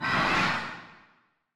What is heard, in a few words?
Homunculus Skinning Dmg